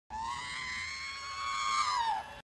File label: r2d2 scream wreaw